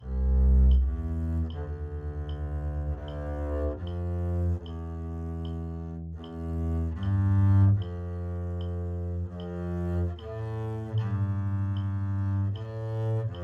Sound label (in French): contrebasse